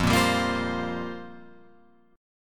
F Suspended 2nd Suspended 4th F down Guitar Open F; Fsus2sus4; strummed